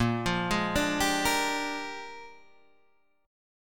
A sharp 13th A sharp 6,8,6,7,8,6 down Guitar Standard; arpeggiated; JGuitar